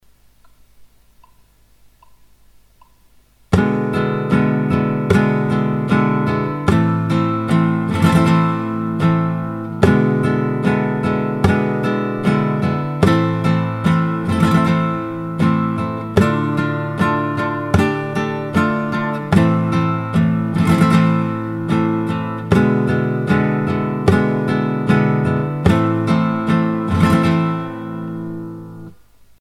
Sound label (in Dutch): Farruca compas basis met korte rasgueado